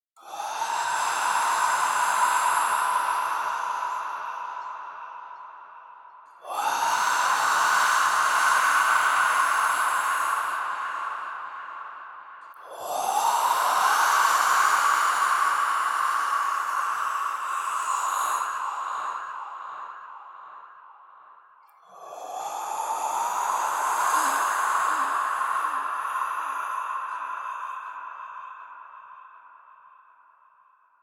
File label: Ghost Scream